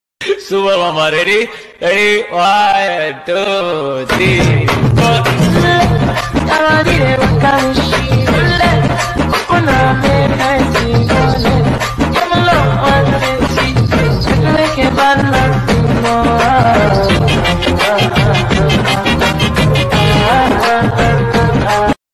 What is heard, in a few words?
bgm song